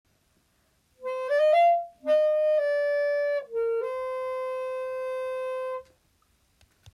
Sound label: Habitat morph music